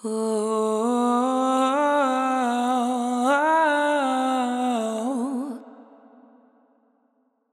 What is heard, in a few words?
Oooh, Vocals; GhostHack